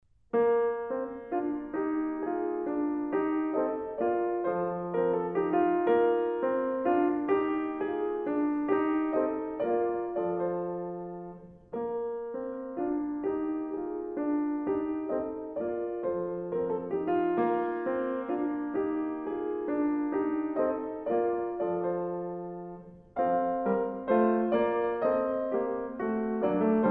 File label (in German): partita 1 b dur menuet II; bwv 825